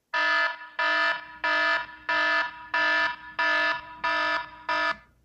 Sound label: alarm